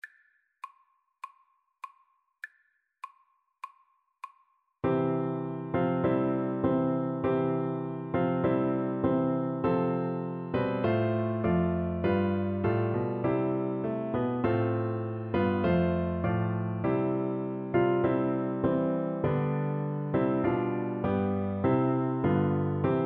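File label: VLC karaoke, Cello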